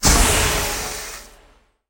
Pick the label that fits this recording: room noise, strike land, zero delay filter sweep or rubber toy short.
strike land